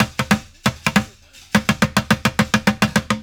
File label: Street Groove, Ambient Grooves